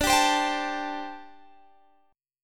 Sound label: G Suspended 2nd Suspended 4th